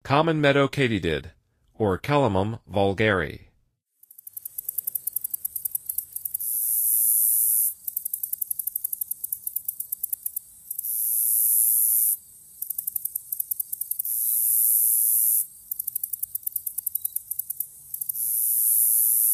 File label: Common Meadow Katydid; N. America